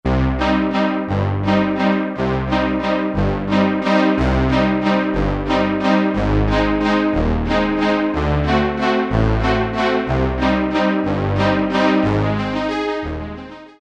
DETUNE PAD; Akai SG01v

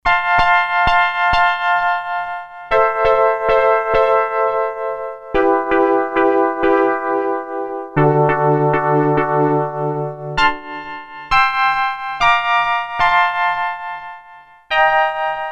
Plucky, Sequential Circuits Six-Trak; Factory Programs

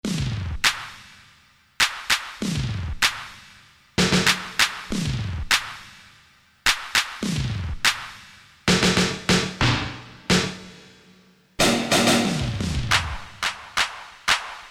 Drums, Korg DSS-1; Drums & Effects

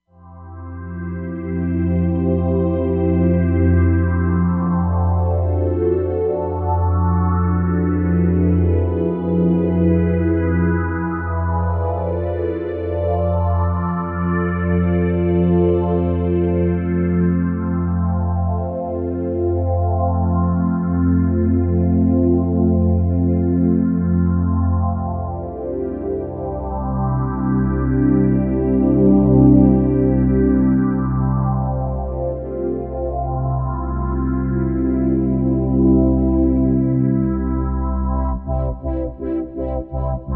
JP-8 Phase; Roland Fantom XR

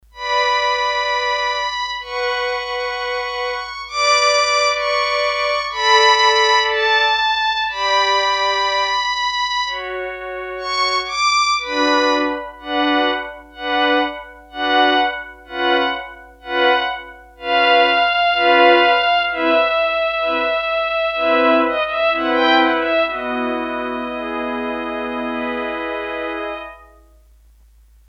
Hi Strings, Korg DS-8; Factory Patches

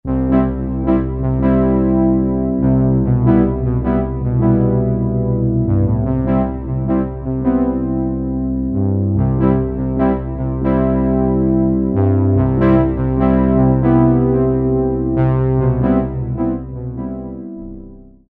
Soft Horns, Korg 05RW

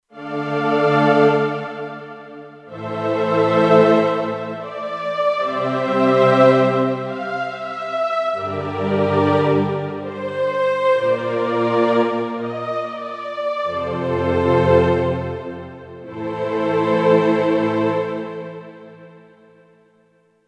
Choir Strings, Kurzweil K2500X; Factory Programs

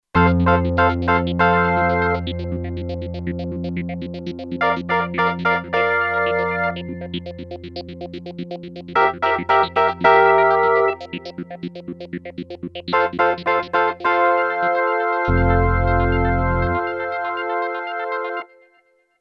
Kurzweil K2500X Clav EP Organ

